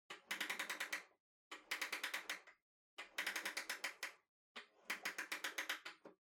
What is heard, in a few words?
Pendulum Clock Hardware Far Wind Up